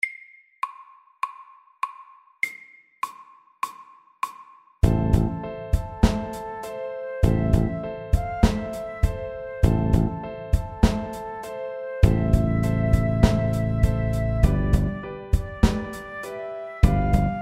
A minor rap SAKTE

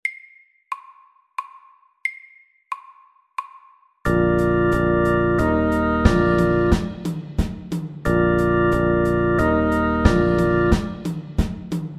Fusion SLOW 90

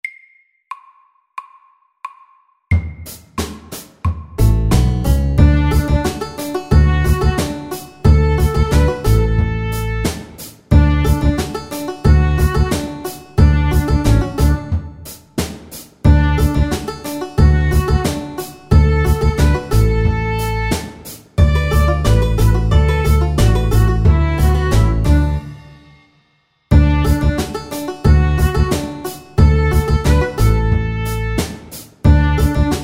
Bluegrass SAKTE

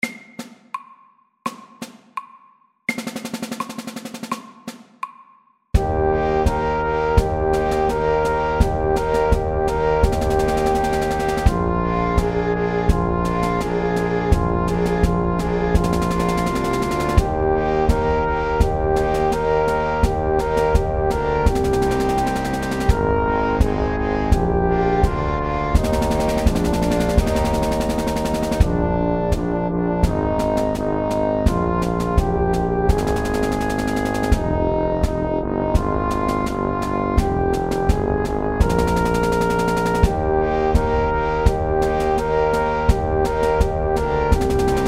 SLOW; Messingmarsj

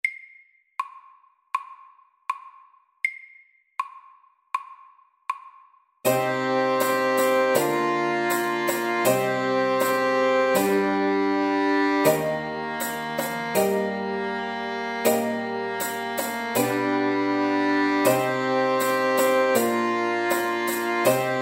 Old English dance SLOW 80

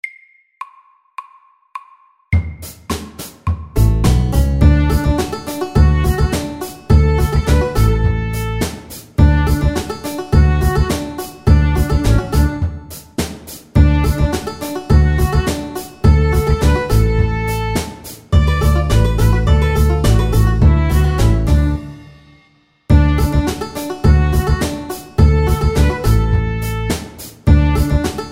Bluegrass MIDDELS

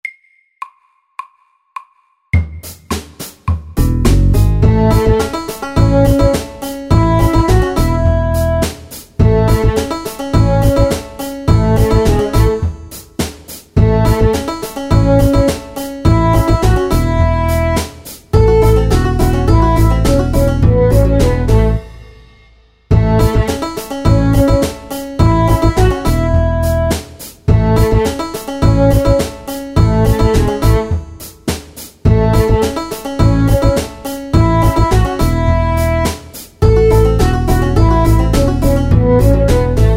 Bluegrass MIDDELS

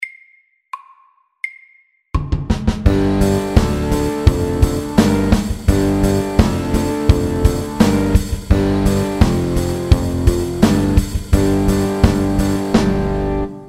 Mountain rock SLOW 85

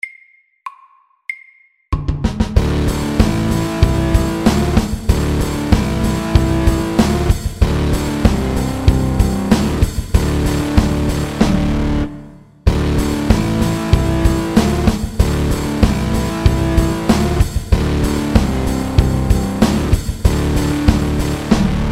Mountain rock MIDDELS

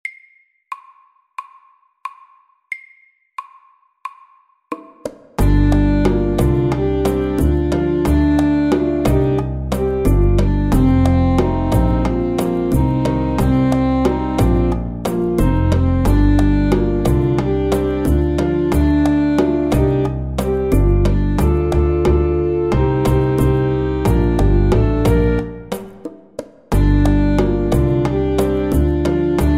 Driving saxes SAKTE

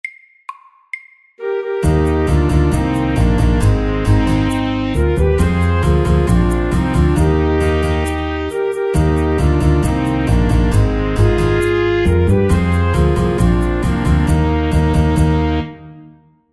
Mariachi band FORT

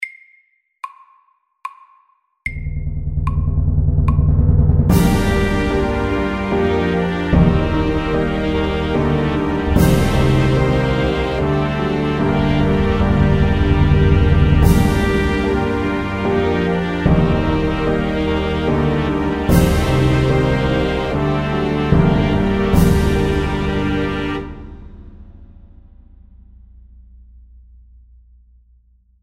Filmmusikk MEDIUM 74

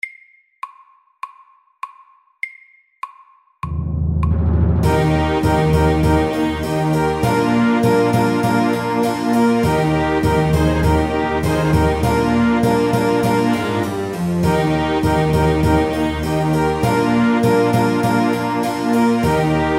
Prelude MEDIUM 100

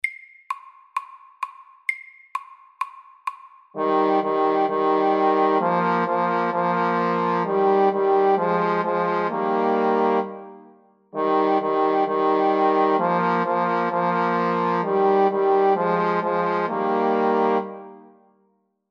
First fanfare FAST 130

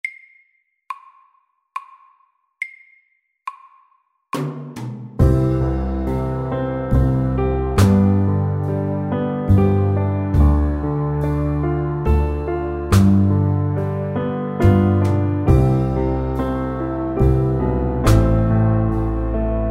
Soft rock SLOW 70

